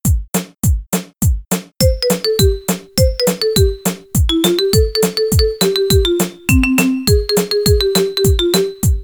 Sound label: Lost Mexican Song without bass